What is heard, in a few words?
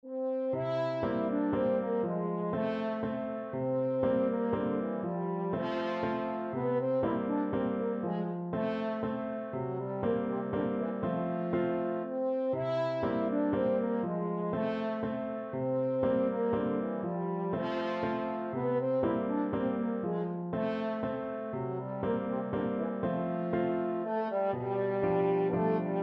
waltzes, french horn